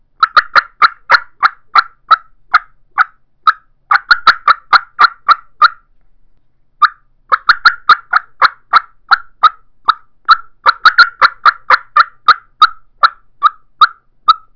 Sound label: stingray mouth call cutts, turkey; Southland